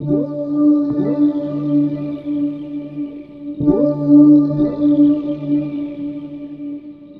Guitar Textures

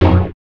chords

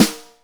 SD BRAS, Korg EMX-1, Drum Machines

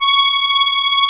STEIRI C#5, STEIRISCHE

